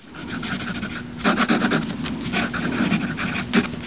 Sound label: Crayon Drawing on Paper